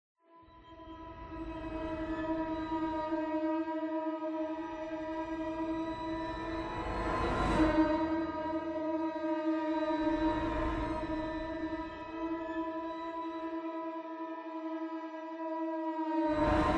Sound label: Cave Atmos; Hellblade Senua's Sacrifice